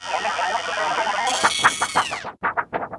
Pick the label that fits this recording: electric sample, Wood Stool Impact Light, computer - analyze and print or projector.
computer - analyze and print